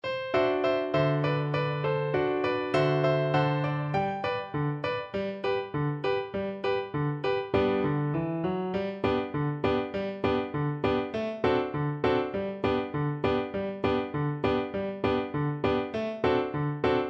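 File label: viola karaoke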